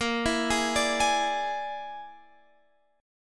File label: E 7th Flat 5th, Bb